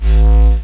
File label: ethno, ethnic; Techno Trance Essentials